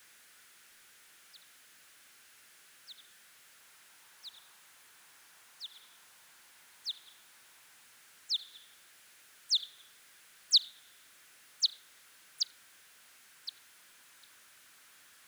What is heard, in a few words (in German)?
Ausflug abends - Mausohr Ortungsrufe 2m Distanz vorbeigeflogen (Zeitdehnung 10x)